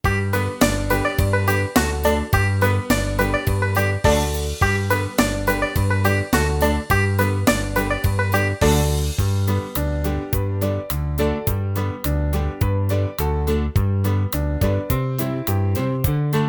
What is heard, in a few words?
kids english songs minus